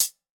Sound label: hihat